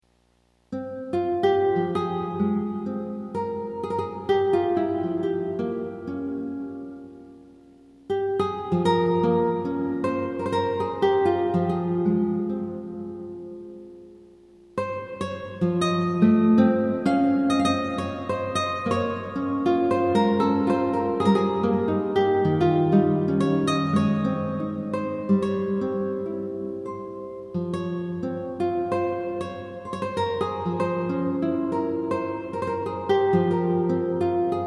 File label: Guitar Theme